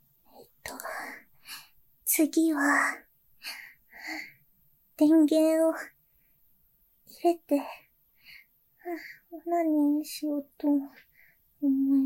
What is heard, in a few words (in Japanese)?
吸うディルドの全機能解放から連続イキ・潮吹きしても止められない全力オナニー, 実演オナニー; 裏垢女子; 連続絶頂クチュグチュ潮吹き